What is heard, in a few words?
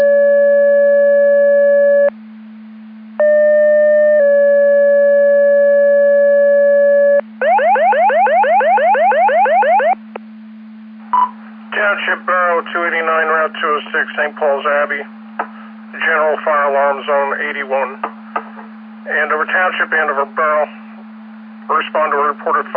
Siren; Andover Twp Boro